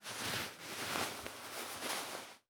cloth stroke; anemoi

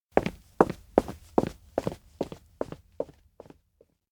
steps indoor town hall leave; fumiya; anemoi パッケージ版 1.1

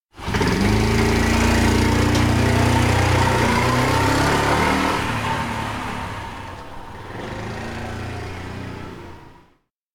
bike depart; chiharu; anemoi

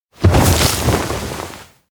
wheatfield fall; anemoi

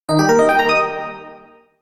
elec ping; anemoi

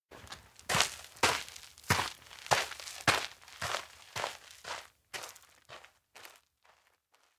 steps outdoor schoolyard walk wind; anemoi

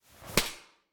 grab hand; aino

